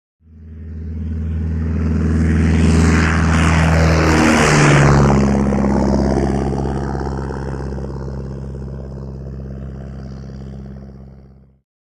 Prop Plane; Take Off; Tiger Moth Take Off Overhead, Then Returns Overhead.